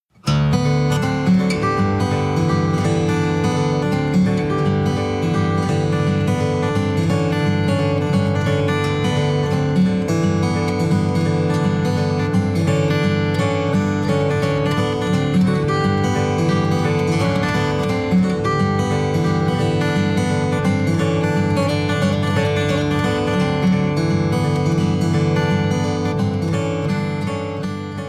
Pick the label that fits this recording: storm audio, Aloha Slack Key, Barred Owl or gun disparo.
Aloha Slack Key